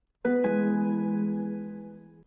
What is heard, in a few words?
Rolls-Royce Chime